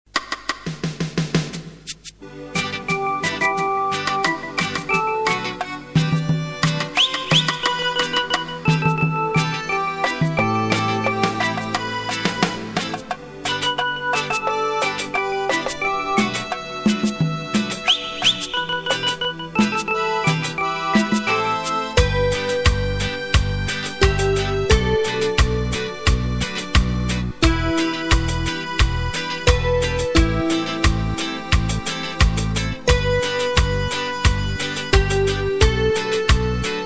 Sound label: Reggae